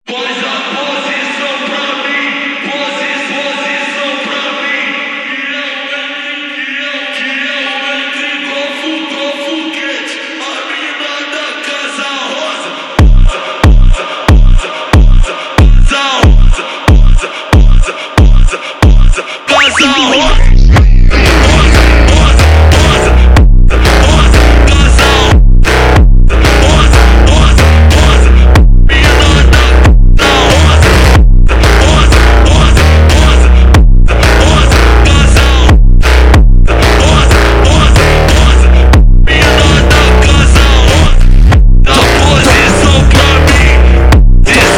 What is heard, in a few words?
phonk, Slowed